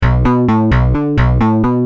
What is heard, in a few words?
Rage, Bass; Industrial